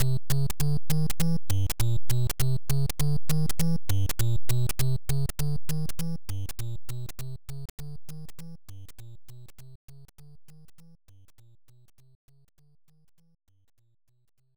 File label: Slot BGM ~ Nervous Breakdown BGM; Super Mario Bros. 3; NES